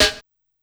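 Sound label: A#1 Analog Snare Drum Open Rim, Dance Kit; Yamaha PSR-E323; drum machine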